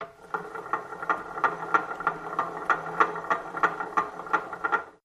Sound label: Gears Meshing Cycli